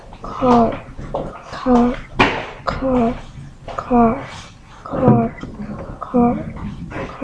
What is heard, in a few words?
car